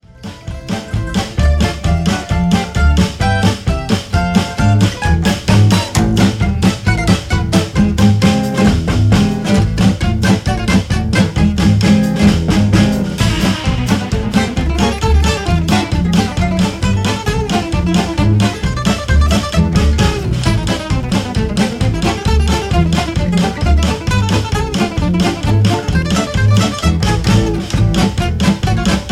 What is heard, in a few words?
Circus Polka